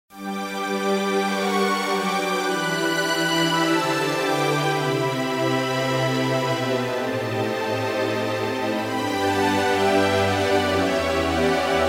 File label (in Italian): Stradivarius Orch violino